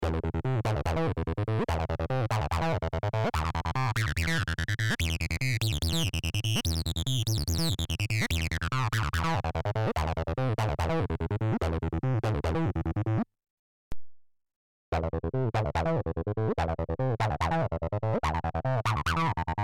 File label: TB-303 Bassline Dist; VS Audiorealism Bassline V2